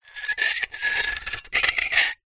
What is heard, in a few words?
glass shards x2 scrape together; foley